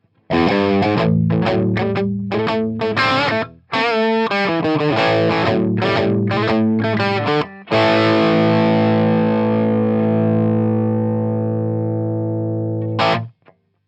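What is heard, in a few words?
gtr crunch, guitar crunch; sennheiser md421-ii testbericht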